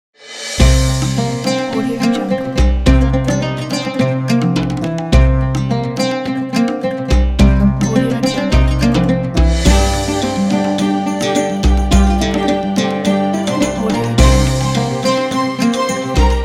ramadan logo intro

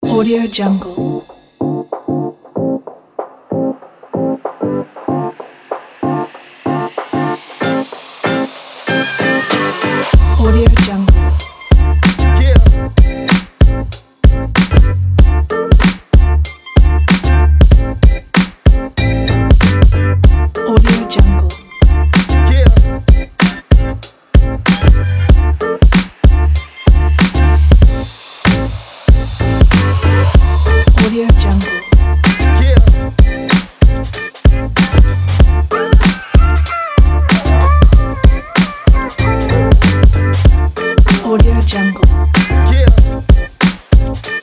hip hop

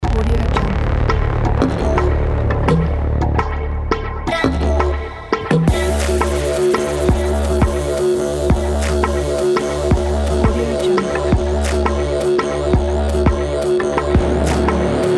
drum n bass

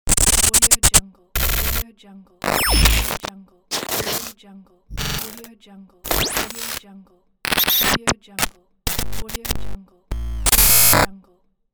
digital glitch; welltone

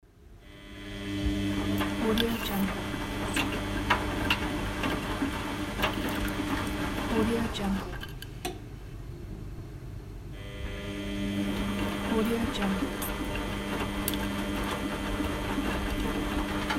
washing machine

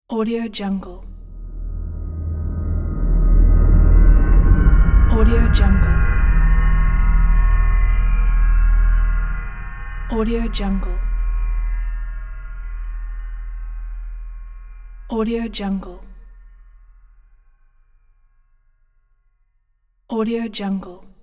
time terror transition

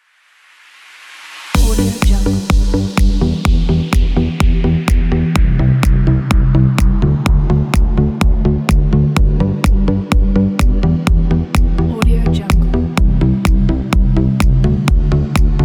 summer house music

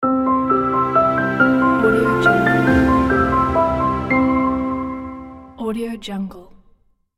company logo

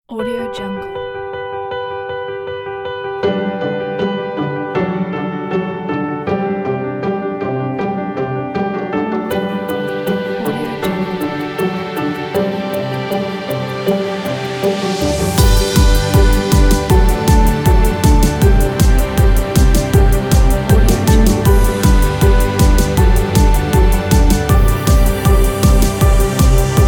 holiday

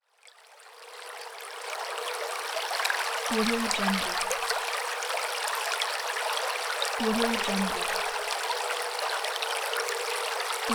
water night stream; cyrilkel

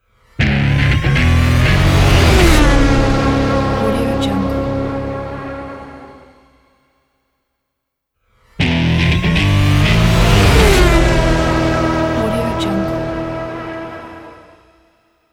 sport car logo

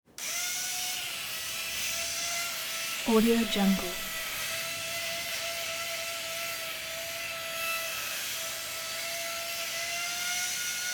electric razor shaving electrical buzz clicks; prosoundfx

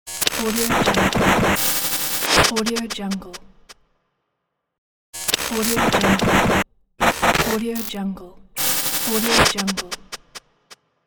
strong distortion

